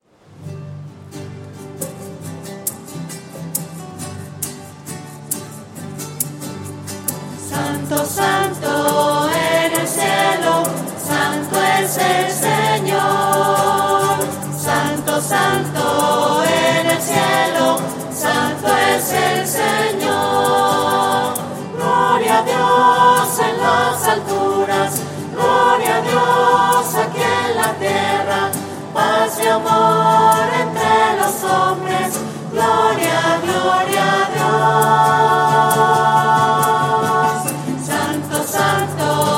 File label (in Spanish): santo santo voces